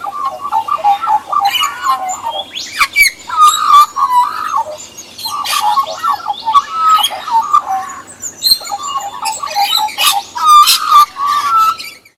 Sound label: Magpie song